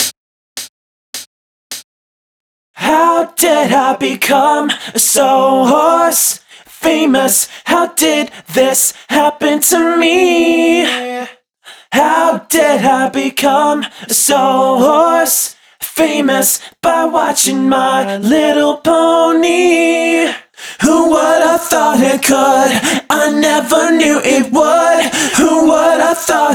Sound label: Chorus, 105 BPM, Key of Am, Vocal Stems; Chorus 2; Pony Fan Music Remix Stems